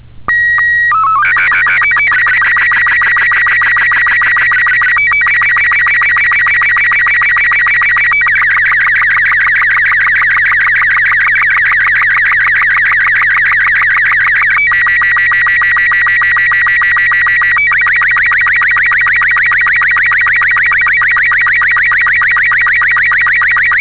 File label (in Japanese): SSTV, ロボット36秒; 静止画像通信Slow Scan TV; SSTVの各モードの送信VIS音が聞けます; 約３６秒; COLOR SSTV